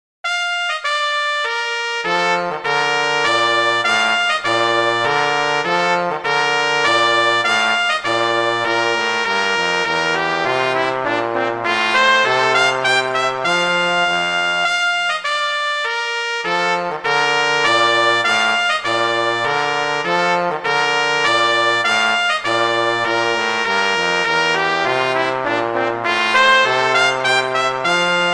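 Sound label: Duet, horn; Mozart; Duets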